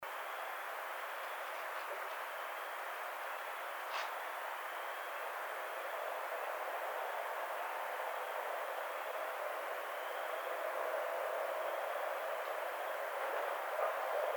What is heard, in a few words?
Barred Owl